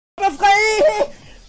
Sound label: ehee